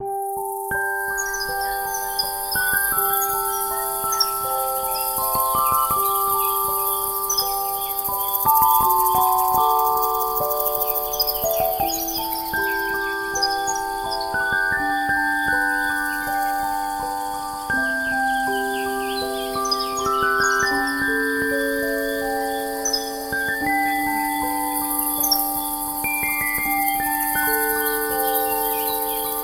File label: 3D Romantic Forest, ringtones; SKY IM-A770K-VEGA-Racer